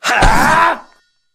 skill fire

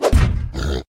sling land

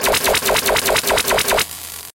spark

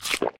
eat

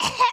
Scream

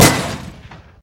stadium hit

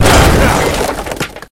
skill dunk

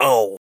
Scream

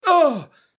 Scream

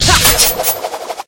Giant rush

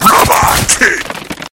Robot kick

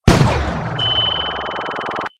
earth fly

